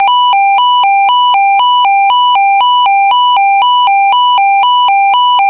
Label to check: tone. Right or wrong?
Right